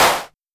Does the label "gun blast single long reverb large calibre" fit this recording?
No